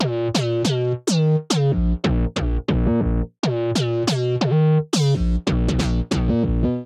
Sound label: D# Minor 140 bpm, bassline; MIDI Clips; Tonal; Skitter and Step; Ableton Factory Packs